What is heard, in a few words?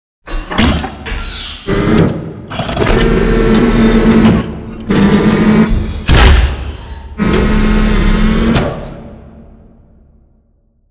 Assembly Wall Arm Close; Titanfall 2; Boomtown